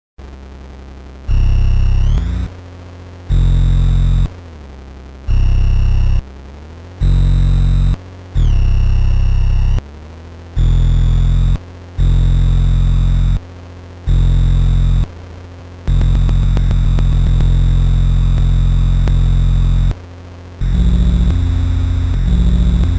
Noise Loop Slicing

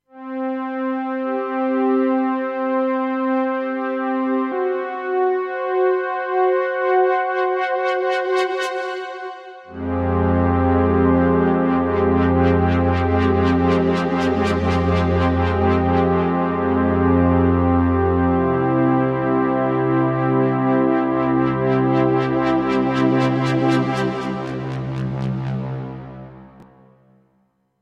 Intro Soft Fanfare

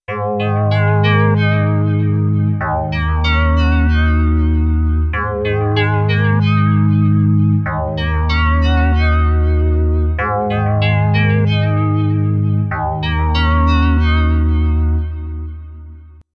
Korg minilogue xd

